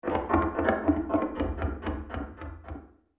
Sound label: wood run by